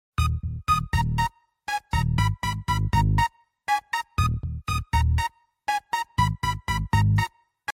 dj sms ringtone

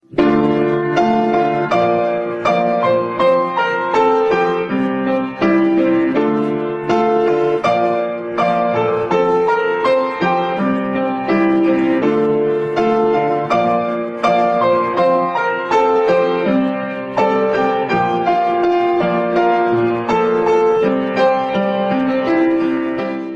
piano cover

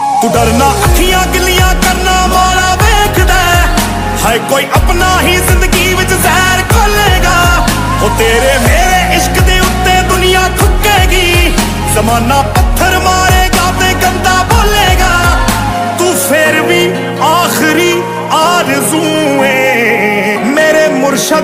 bollywood song tone ringtone

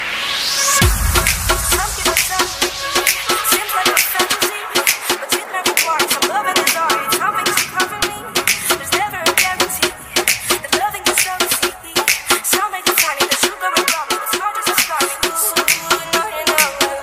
dance ringtone; english ringtones

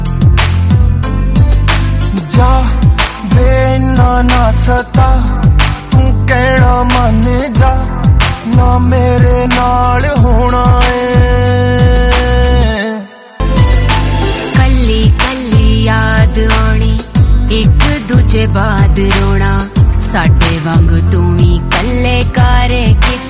latest punjabi song ringtone; bollywood

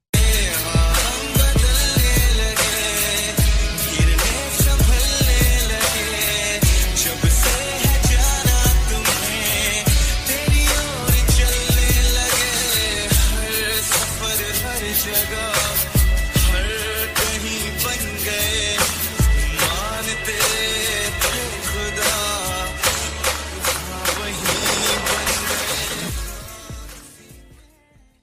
romantic music ringtone, bollywood ringtones

